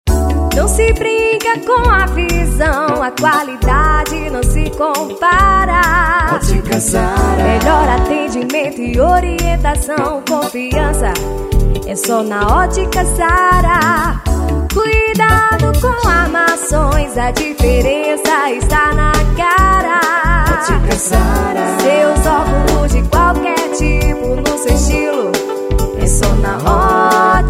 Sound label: JINGLE; OTICA SARA